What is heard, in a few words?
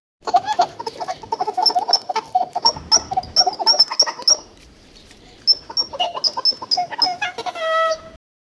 mud huts painted with bri, clip clop